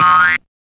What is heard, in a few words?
Mino Move Jump; kids-tetris; Circus